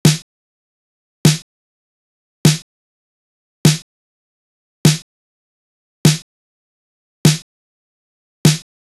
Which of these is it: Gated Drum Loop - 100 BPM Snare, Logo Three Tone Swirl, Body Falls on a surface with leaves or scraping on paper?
Gated Drum Loop - 100 BPM Snare